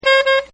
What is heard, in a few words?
SAX Riff; Horns; Sound Effects:Musical; Sax Riff 4